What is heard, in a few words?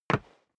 step boots single wood parquet single stair up; Footsteps; schritte